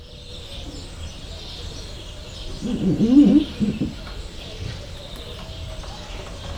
ablaküvegen húzza az orrát, fehérkezű gibbon; jaszberenyi zoo 2015

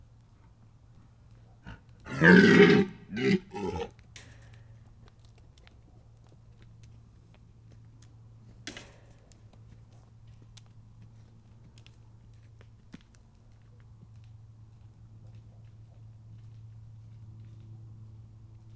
gímszarvas horkantás; budakeszi zoo 2010

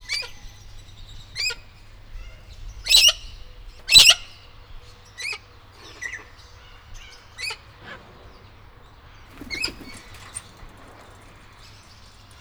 eles hangot hallat, rozsas kakadu; állathangok; nyíregyházi zoo 2010

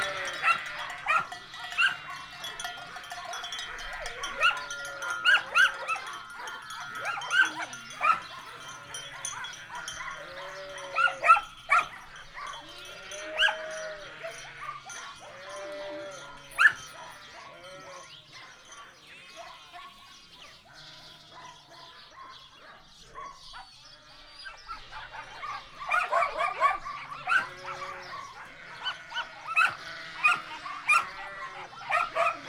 terelőkutya; laszi tanya

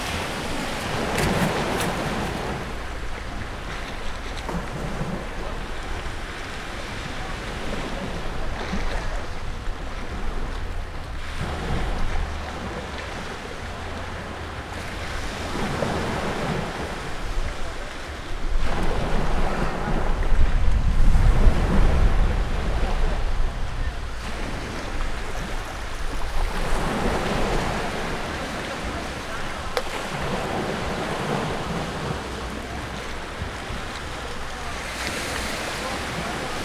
palakozet, beach, varfalalol, tenger; thassos 2016; metalia

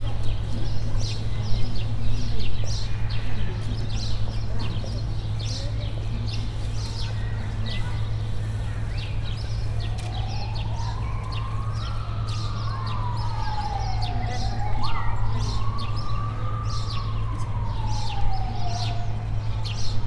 csipognak, szirénázó jármű, házi veréb; debreceni zoo 2012

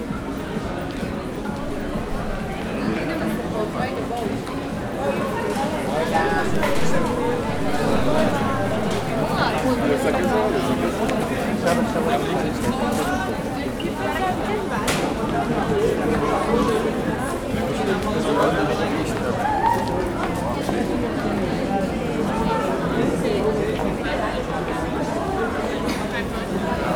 forgatag, Szent Márk tér; olaszorszag; velence

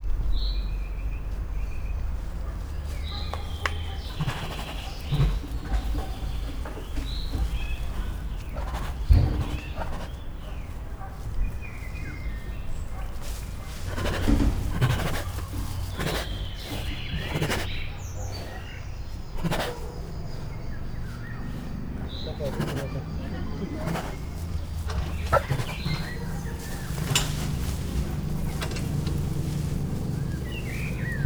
egymás közti kommunikálás, szibériai tigris; szegedi zoo 2011

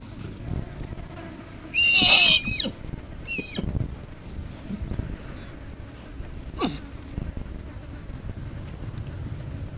közelről a szél elviszi a hangot, vadló; Hortobágyi Nemzeti Park; pentazug

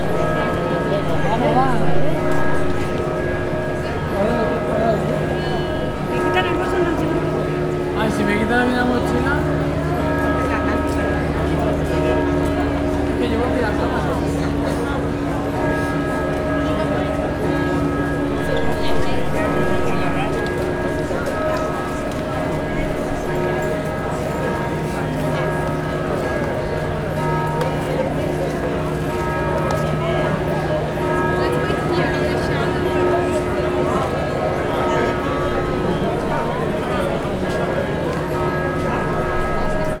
szent mark szekesegyhaz, harangozas szent mark ter; olaszorszag; velence

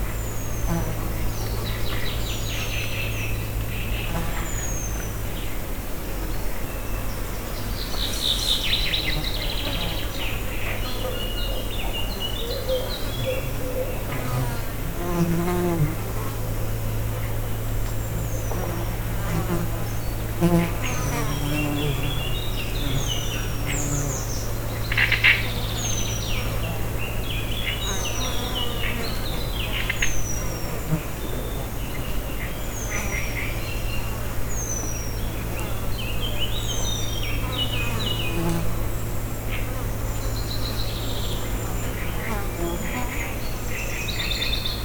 erős légiforgalom, rovarok; termeszetben; tiszababolna

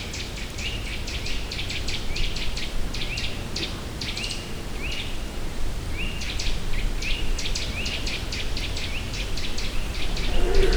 madarak zörgő ágak mély völgyben bőgő bikák, gímszarvas

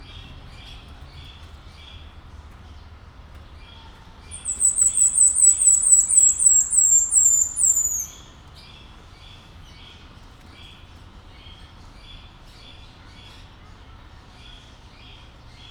magas hangon szólal meg, vöröshasú tamarin; debreceni zoo 2019

